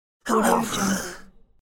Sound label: zombie moan